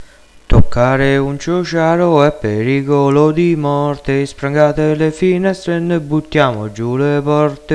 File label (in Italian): Canzone